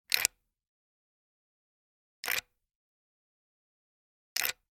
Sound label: gun model 1894; still north media